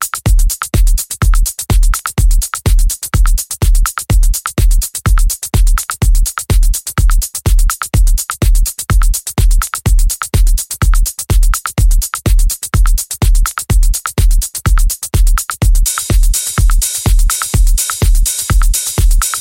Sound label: FACES DRUMS